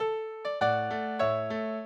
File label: minuet, mozart